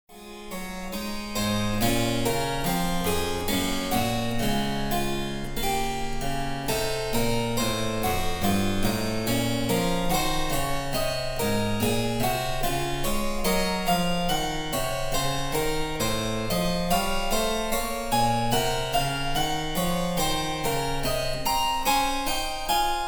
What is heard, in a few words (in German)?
Cembalo; Mark Lindley WTC 1